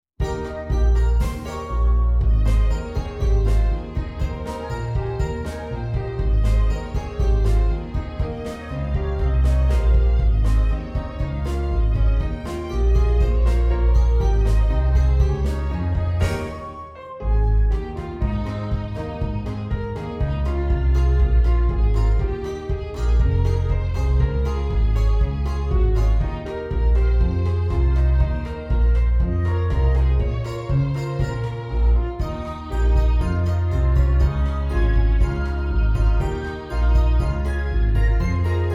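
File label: Curtain Call orchestra; Twelve Dancing Princesses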